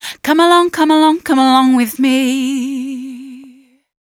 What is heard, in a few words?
female vocal